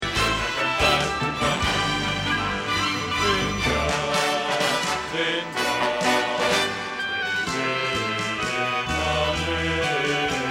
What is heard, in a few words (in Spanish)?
Bajo